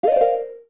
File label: Pause Menu Close; Close the pause menu; OOT